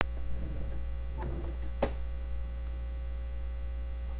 laugh

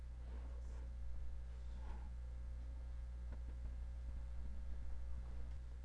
rip

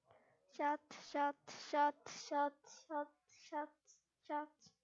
shot

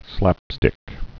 slapstick

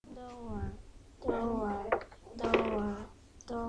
door

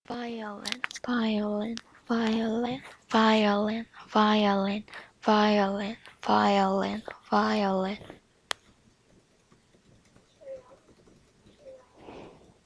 violin

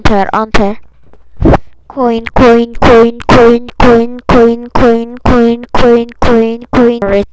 coin

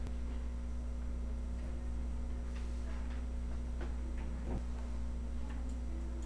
click

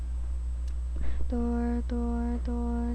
door

